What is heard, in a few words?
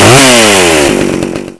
chainsaw rev